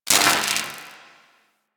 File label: Gas Robot Repair Spark; Warframe; Corpus Gas City